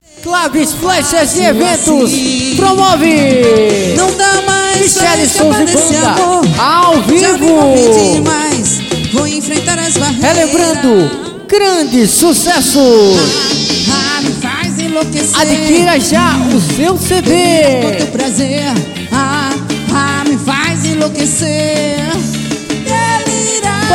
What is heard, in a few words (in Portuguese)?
vinheta